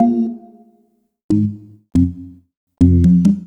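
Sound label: Lead Jazz organ, Drum & Bass Keys; Sonic Foundry (Sony Creative Software); Knutrix Drum & Bass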